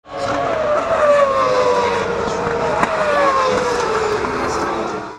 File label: F1 pop pop, engine